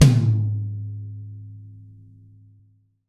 TOM, Dance Mega Drums; Best Service

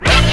HIPHOP HIT, Synth FX Misc

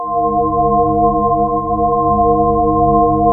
JV FX SPACE; Syntec; Wall of Sounds

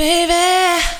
"baby", female vocals; PlatinumPhattCD2; E-MU Producer Series Vol. 8 – Platinum Phatt

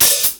HAT

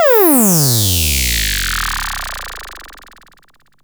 SYNTH FX

